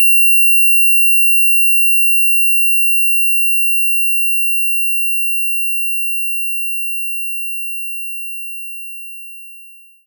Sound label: Cold Glass Wave